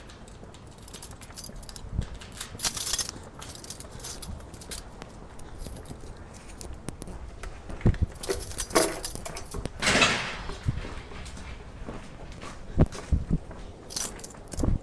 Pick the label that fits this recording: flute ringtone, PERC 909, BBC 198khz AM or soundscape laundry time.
soundscape laundry time